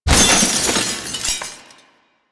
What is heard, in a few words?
glass window break; Nineteen Ninety Nine